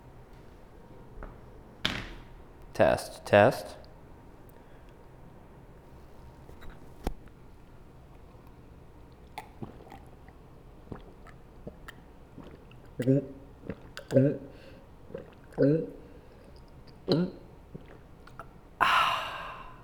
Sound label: Chugging Water Glug Glug Glug Ahhh